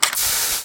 smoke start

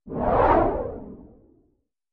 zoom

